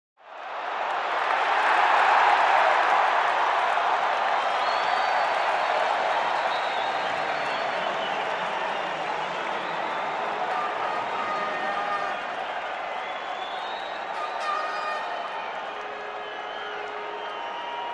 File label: Crowd in a sports game cheering with horns